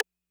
Rimshot Accent, 808

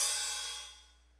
hihat; Big Fish Audio; Hardcore Breakbeat Action; Robot Live

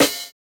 Snare, jungle breaks drum sounds

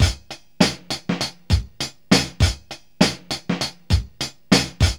NYC SWING; Zero-G; Funky Elements

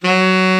BRA TENOR, SAX; Best Service Gigapack 1; BR SAX 2

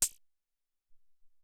C Syn Hat, Alesis SR-18

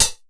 MANUHAT, drum; Crypton - DrumBase5000

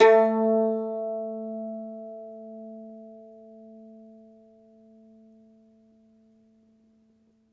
MANDOLIN, Plucked Strings

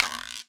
EQ Perc, Percussion Mix

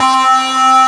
single-synth, techno, c; Techno ID

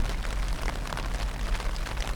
Weather, On The Glacier

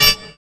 CYBER KIT, DRUMS

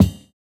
Kick, jungle breaks drum sounds

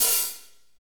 hat, Power Ballad; Northstar; Drumscapes Roland

